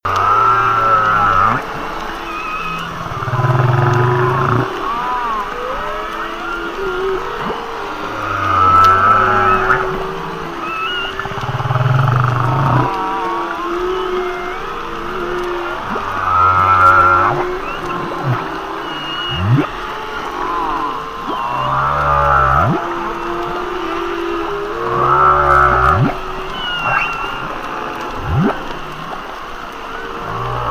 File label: Humpback Whales